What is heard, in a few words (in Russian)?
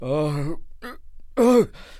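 мужской сдержанный стон во время оргазма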